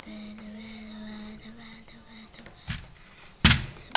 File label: pad